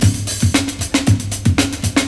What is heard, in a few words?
OPEN LOOP, BREAKBEATS; Crypton - DrumBase5000; BREAKBEATS#4